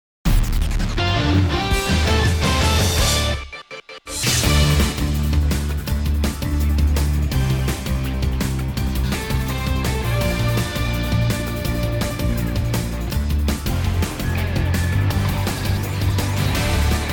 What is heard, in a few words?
BED NO VO JINGLE; AFFILIATE SF